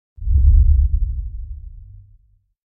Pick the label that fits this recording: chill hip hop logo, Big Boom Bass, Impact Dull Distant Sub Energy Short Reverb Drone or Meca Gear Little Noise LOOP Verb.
Impact Dull Distant Sub Energy Short Reverb Drone